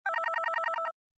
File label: alert, computer